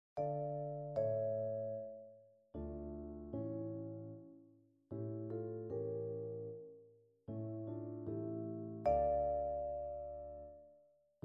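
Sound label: four seasons vibraphone; 1stmvt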